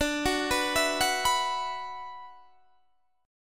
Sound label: D 6th Add 9th D, Guitar, Standard; D6add9 arpeggiated; 22,21,21,21,19,19; JGuitar